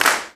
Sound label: REAL CLAPS, Ensoniq ZR-76